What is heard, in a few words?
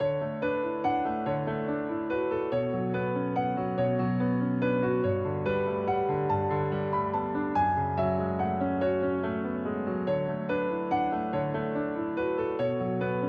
Piano Melancholy loop; Ruins Seeker; Peritune Material